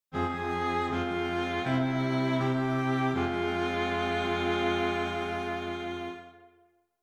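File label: string quartet, dictation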